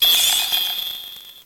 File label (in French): Cri